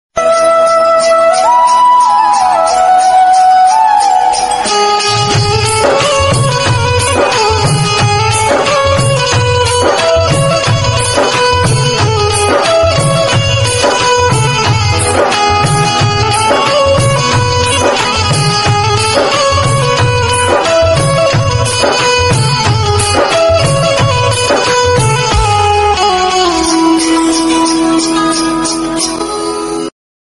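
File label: theme background music remix instrumental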